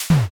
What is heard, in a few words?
play card